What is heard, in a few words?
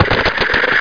cat walk